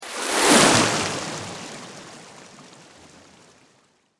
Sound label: Sweep Organic Slow